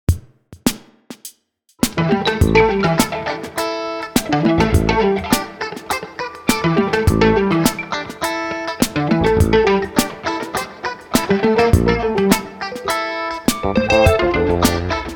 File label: pedal funk; weeklybeats 2024